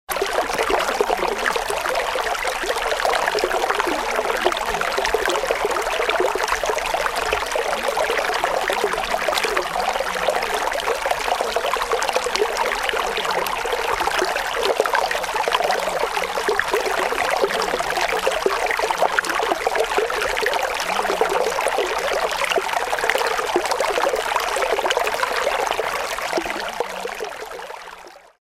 Wasser kleiner Bach, Ladakh

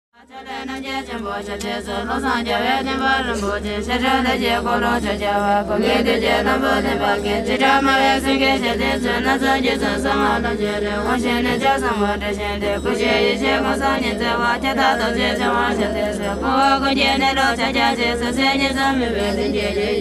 Lhasa Barkhor Nonnen

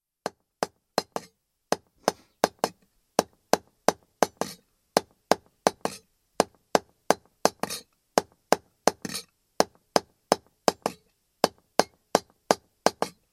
Kupferarbeiten

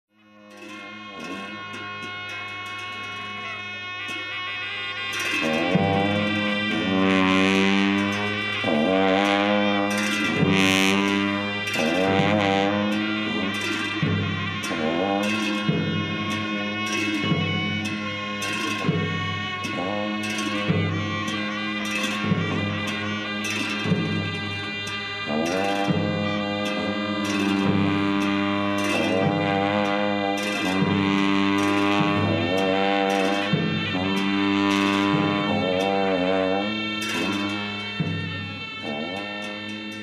Maskentaenze Trompeten, Ladakh

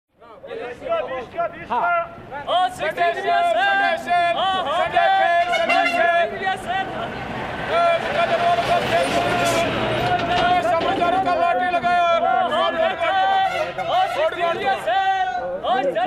Leh Marktgeschrei

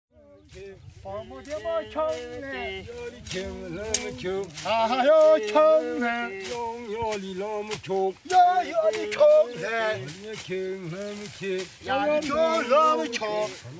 Maehen Maennergesang, Ladakh

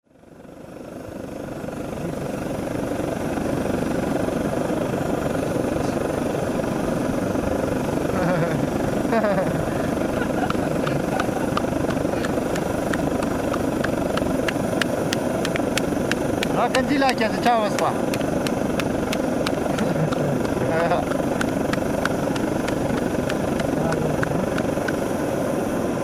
Zelt Morgens

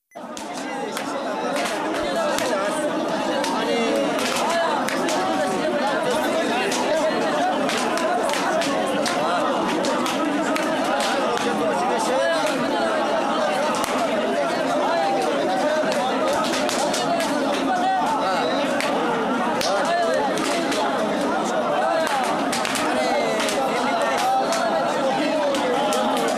Lhasa Sera Moenche

